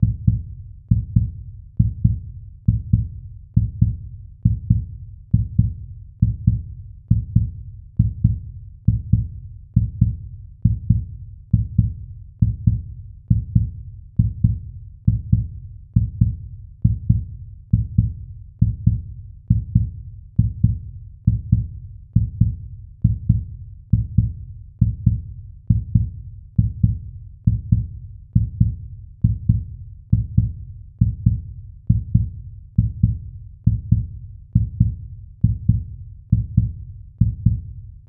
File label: Heartbeat Fast